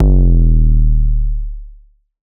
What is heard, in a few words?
kick drive